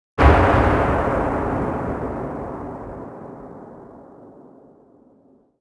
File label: expl mid tail, explosion; World of Tanks Blitz